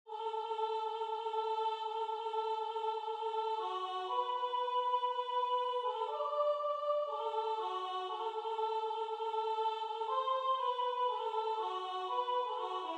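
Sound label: Soprano, Religious Folk Songs Of the Negro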